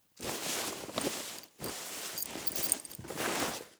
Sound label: bag search; anemoi